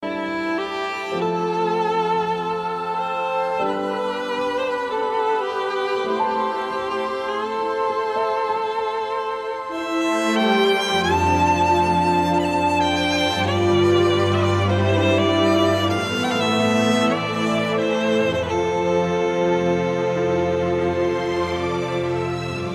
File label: Ringtone; Braveheart